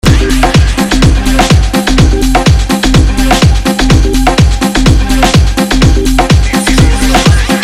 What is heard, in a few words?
Loop